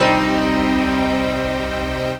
Dance Mega Synth; Best Service; 90 s Sample CDs

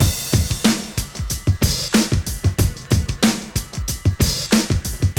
DRM CHOP 93; AMG Gota Yashiki Groove Activator

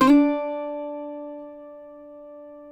CUATRO D 4, Guitars; Spectrasonics; Hans Zimmer Guitars VOL-2

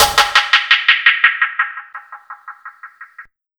TR DELAY, Ragga & Dub Reggae; USB Soundscan vol.27; AKAI

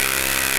scifi; E-MU Formula 4000 Series Vol. 3; Analog Odyssey; VK+ Instruments

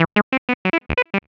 Heavy Industry Syn, Keyboards

